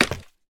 脚手架踩踏声

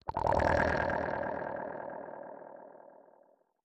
潮涌核心攻击声

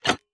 铜活板门开关声

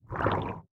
鱿鱼环境声

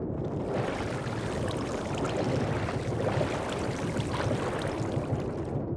矿车水下内部行驶声; ambient

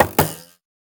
制箭台使用声

